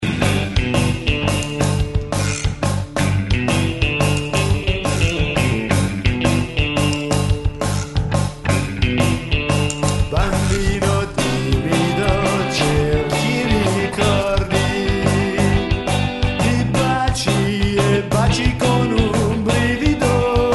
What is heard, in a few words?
rumba